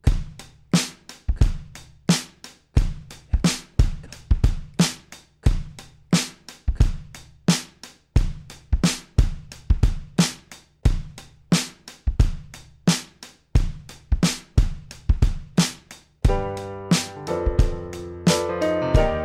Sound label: Instrumental Three